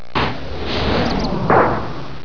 crush

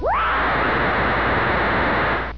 scream

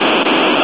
fight

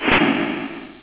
fire

